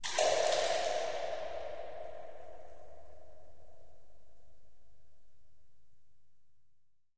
Echoey Cave Drips Wa

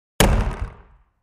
Large Plastic Tank Hi

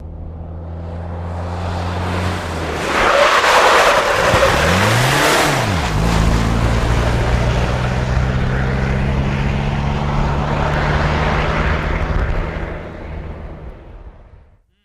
Jeep Chrke 84 Skid Out; jeep cherokee 84

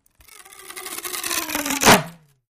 Rip Cascading Stron, plastic

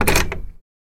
Door Knob Grab; door knobs

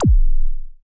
laser, lasers

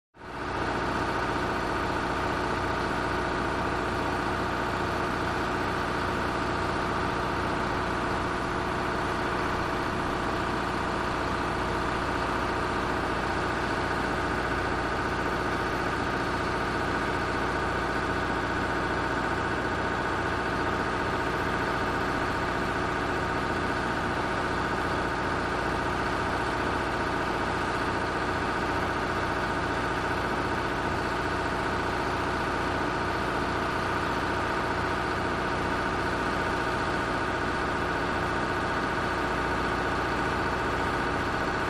Bus Ext Int Idle

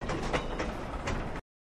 Sngl Clack As Train, track click

